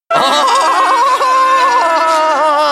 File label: surfer laugh